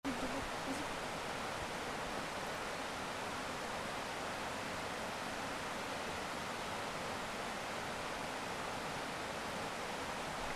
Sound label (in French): AMB RUISSEAU